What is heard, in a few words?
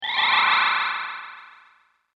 Time Warp, sci fi